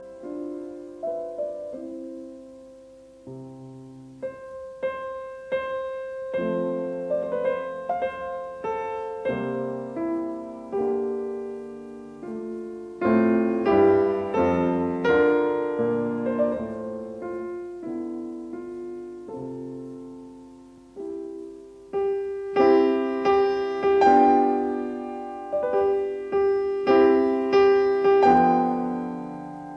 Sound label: Piano Sonata No 10 in C major K 330 II Andante cantabile; Lili Kraus